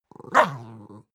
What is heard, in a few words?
dog small growl bark; natur-tiere; hunde